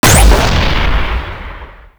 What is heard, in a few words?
Null Star Fire; Warframe; Warframes; Antimatter